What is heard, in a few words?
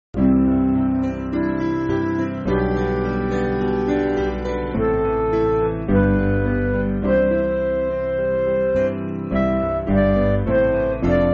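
Midi, Backing Files for Churches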